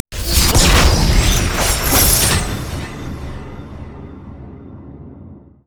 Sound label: alter lock; LoL; altars